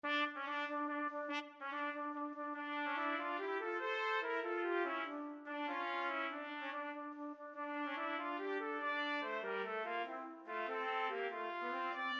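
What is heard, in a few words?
Serenade trumpets; Elgar, Edward - Serenade for String Orchestra Main Theme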